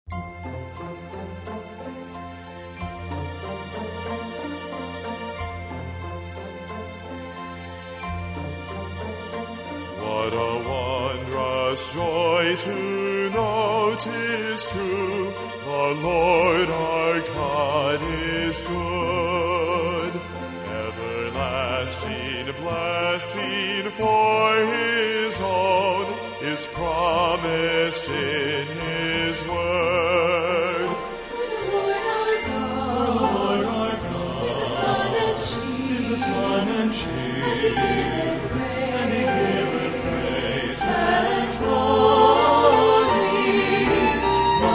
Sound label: Trio, No Good Thing